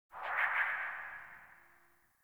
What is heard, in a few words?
UI Starchart Galaxy Zoom In; DE; Warframe; Starchart Redux